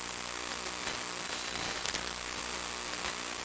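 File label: ambient hum buzz loop, electric; Half Life Model Pack; Half Life Alyx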